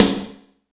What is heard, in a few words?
wet snare sample g key, snare sound tuned to the G note. Loudest frequency: 2434Hz; snare drum samples